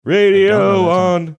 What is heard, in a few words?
singing radio on